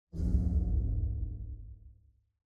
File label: City Mech Crate Activated